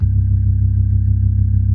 C1F, B3, Vintage Keyboards

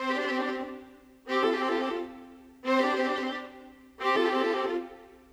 Viola, Orchestral; Sonic Foundry (Sony Creative Software); Orchestral VOL-2; Modern 25

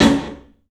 CLUB SN VRB, 3D Drums; 3D Sound

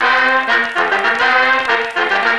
Old Scratchy Sect, Effected Horn Section

